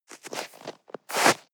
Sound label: step snow scuff; schritte